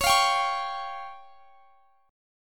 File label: Db Major Flat 5th; strummed